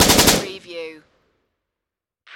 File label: Machine Gun SIG SG 551 Short Burst Close Perspective